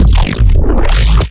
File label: LFO bass drop; neuro; BIG5AUDIO